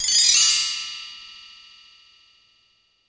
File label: Bell Tree, Standard Kit, Yamaha PSR-E323